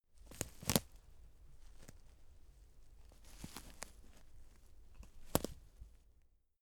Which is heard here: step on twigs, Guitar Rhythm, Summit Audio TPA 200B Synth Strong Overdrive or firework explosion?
step on twigs